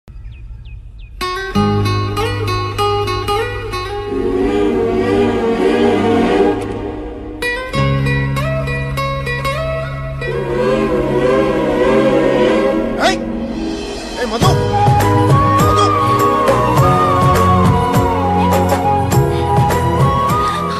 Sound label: bgm ringtone, telugu; sardaar gabbar singh; pawan kalyan; kajal agarwal